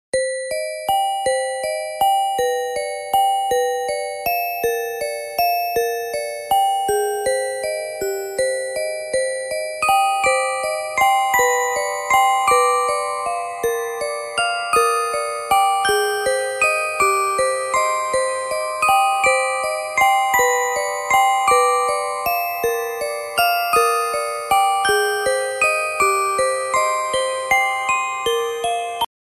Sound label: Creepy Music Box Ringtone